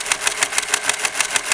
TAPPING; thief

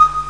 Count Down; The Crypt

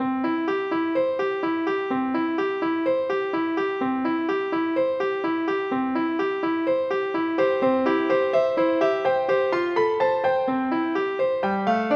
musicbox

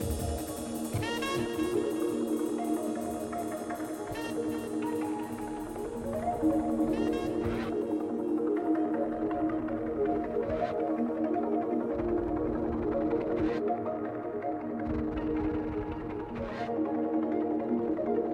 ambience; Sonic Foundry ACID Music

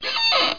creak

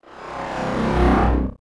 fade out; Sphere

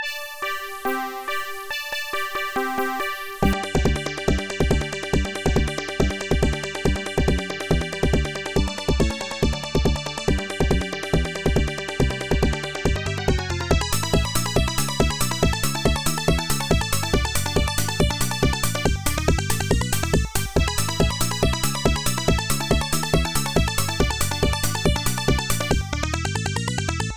hoedown; nostalji

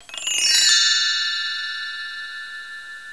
table, dominoes; McDonaldland - Silly Games; McDonald's

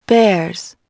bears

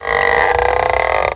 stretch

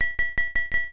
ALERT; smartpandademo

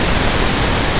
thrust; lander

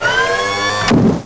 big door; PWRUP

